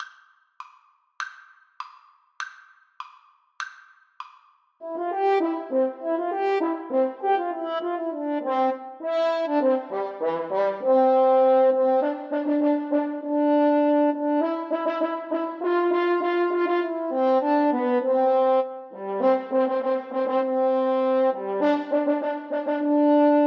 grand old duke HN DUET, French Horn Duet

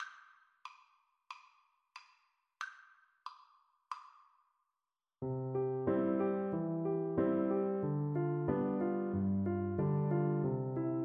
flute

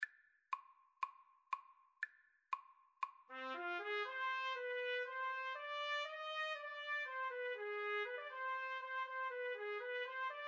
trumpet duet

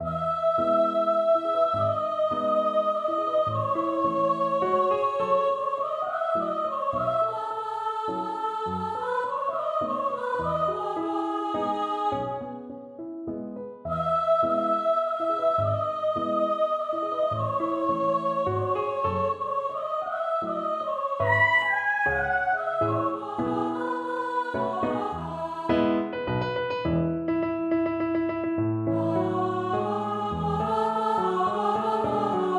SOP, soprano voice; puccini la boheme

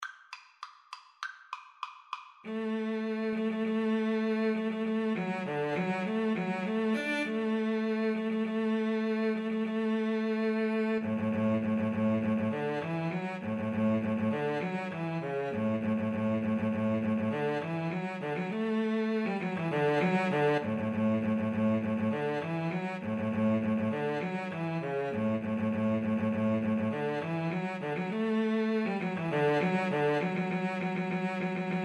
Cello Duet

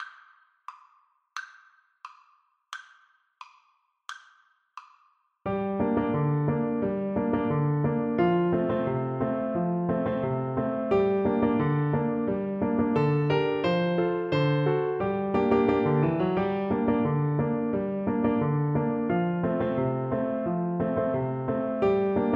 the auld grey cat, Flute

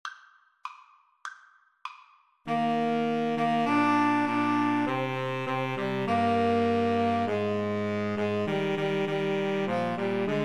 SAX, Woodwind Trio; old st nic

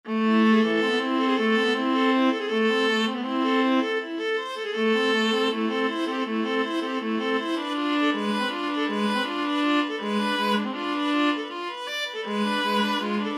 rappel oiseaux, viola duet

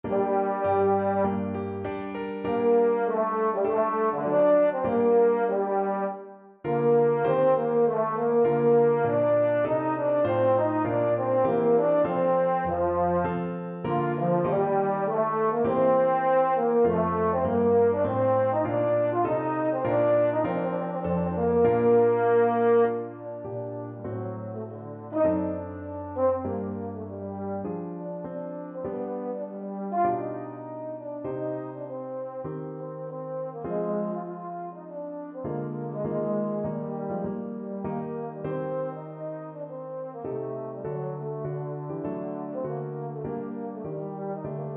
EUPH, euphonium

